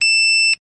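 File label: Clock Alarm Electric Be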